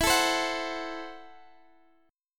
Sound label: C 6th Flat 5th E